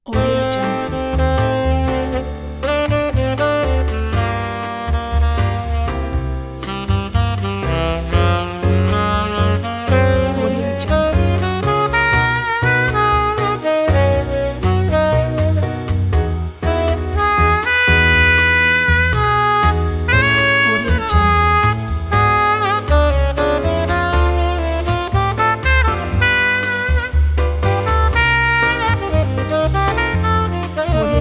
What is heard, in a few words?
sentimental sweet bossa nova